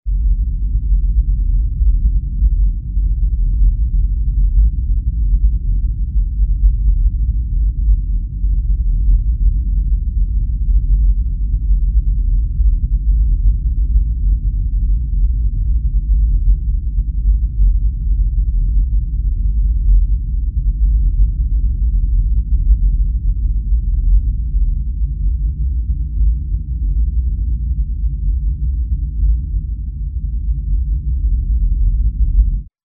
subsonic atmo

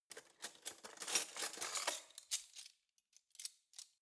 cutlery spoons drawer grab soft long

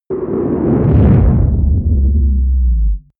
whoosh distorted drop wobble

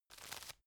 tissue grab soft

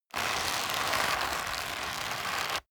leather creak hard

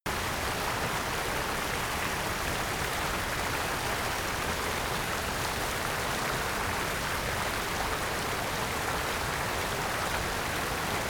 generic waterfall loop small

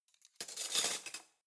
cutlery spoons drawer place soft

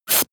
air pressure release

